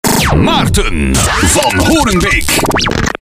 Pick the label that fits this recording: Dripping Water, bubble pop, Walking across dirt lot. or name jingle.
name jingle